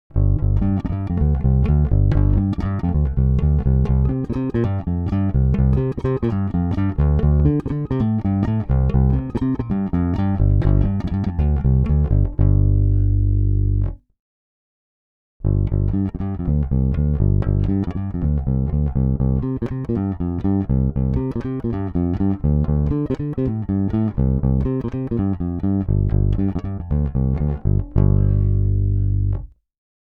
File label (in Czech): Bonusová ukázka, Ukázka se simulací aparátu; Fender Custom Shop Pino Palladino Signature Precision Bass